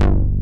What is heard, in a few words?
JPC BASS; Pro-Rec - Synthsonic; JV ANALOG2